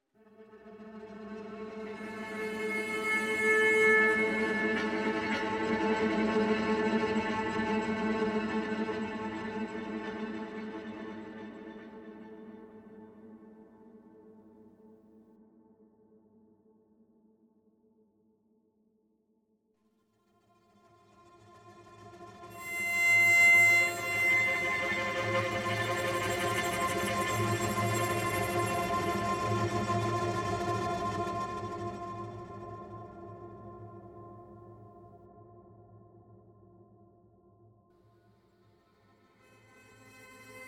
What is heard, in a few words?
Exploration Solostring; Horizon Zero Dawn; PS4